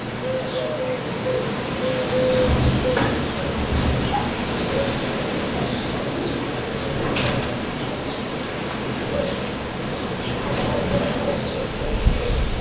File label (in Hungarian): fürdőablaknál, szél zárt helyen; hortobagy